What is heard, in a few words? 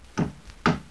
knock knock